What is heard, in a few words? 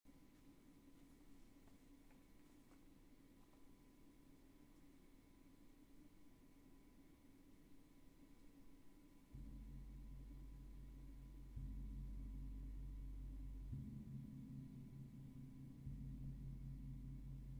Lady Gong